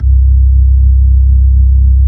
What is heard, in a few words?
B-3